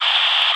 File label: Multiple two-way radio squelches and feedback.; Squelches, Radio Telemetry, Radio Static